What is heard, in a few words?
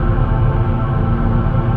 Amb Scifi Spaceship Loop; SFX Kit; Sci-Fi Loops